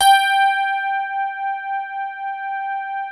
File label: DIGI RHODES; Sound Gallery - Charged